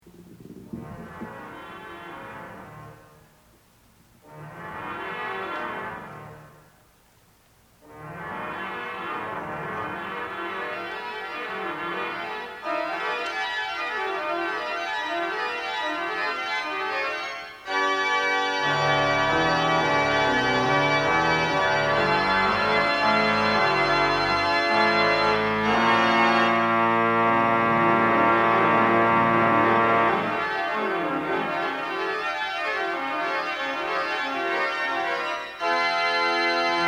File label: Improv On Veni Creator Spiritus; Selah Recordings